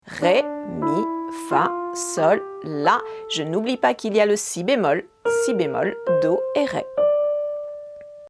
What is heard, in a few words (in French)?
gamme ré mineur